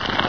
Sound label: leech bite; source engine; hl1; video games